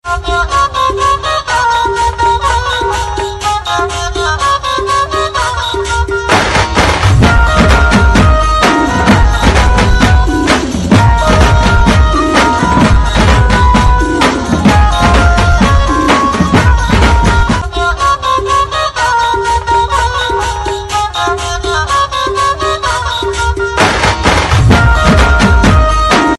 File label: instrumental song